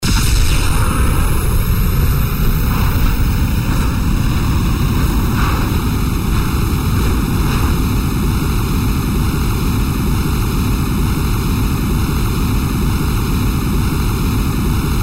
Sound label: NOS, Turbo; RealisticCarControllerV3; Racing Game